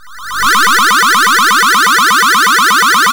Beam; ChipShop; CHIPSHOP DELUXE; CHIPSHOP HITS; YM

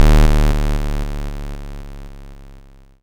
YM FX Fade; SFX; ChipShop Deluxe; ChipShop Hits

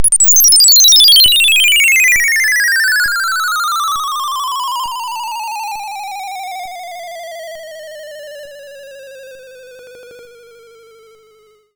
YM Opening; SFX; CHIPSHOP DELUXE; CHIPSHOP HITS

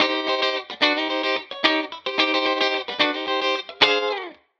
Guitar Line, 110bpm, hiphop; musicradar

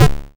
YM FX Wop; SFX; CHIPSHOP DELUXE; CHIPSHOP HITS

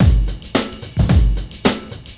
Beat 110bpm; musicradar

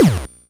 ChipShop GB Tom; DRUMS, TOMS; ChipShop Deluxe; ChipShop Hits

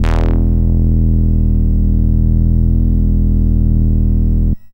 SID Bass E, chip; CHIPSHOP HITS

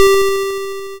YM Synth G; SYNTHS; CHIPSHOP DELUXE; CHIPSHOP HITS

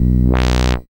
SID Bass B, chip; CHIPSHOP HITS

